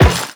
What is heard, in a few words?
Run Metal, Footsteps; Warframe; The New War; Characters; Kahl